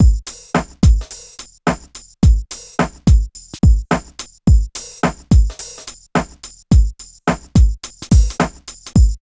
LOOP

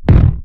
stomper womper kick

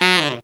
TEN F# SF, Phantom Horns TENOR FX; Zero-G

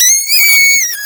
VOC, Drum & Bass, 160 BPM; Ueberschall; Drum & Bass Flash Trackz

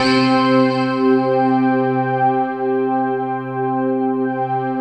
World of Synthesizers, SYNBELL

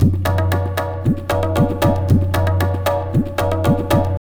tabla, 115 bpm; Zero-G; Pure Tabla

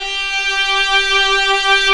viola, 3D Viola Xtreem; E-MU Producer Series Vol. 5 – 3-D Audio Collection; 3DSynths

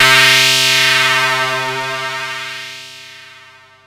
Anasound Long mid C, Analog Single Notes

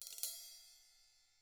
cymbal ruffs; ILIO; Double Platinum Drums 1

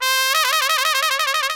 THE TRUMPET

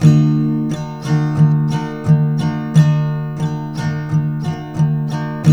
TK MIN E 3, Guitars; Spectrasonics; Hans Zimmer Guitars VOL-1

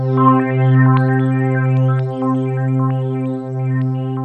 S+H TEXTURE, SH-101; Rubber Chicken Software Sample Bank; ROLAND SH-101

